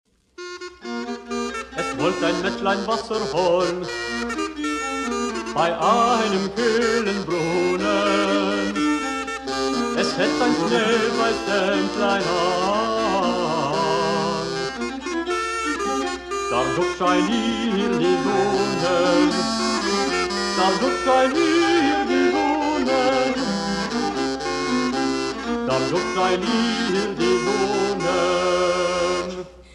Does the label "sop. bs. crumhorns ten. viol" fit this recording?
Yes